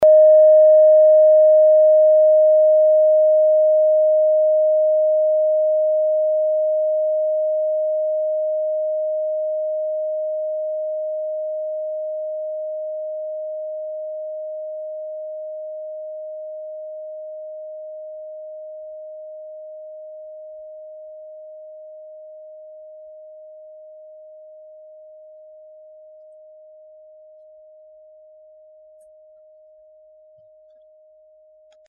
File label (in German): kleine klangschale